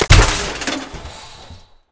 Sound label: WPN Fire 2D; ARMES; FatMan